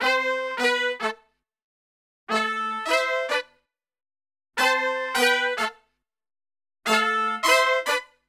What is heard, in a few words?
POP AM-G, Brass; Best Service; Brass Super Section; POP LICK